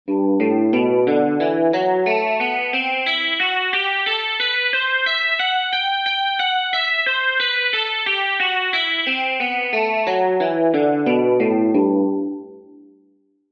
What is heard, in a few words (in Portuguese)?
Exercícios Guitarra 60 bpm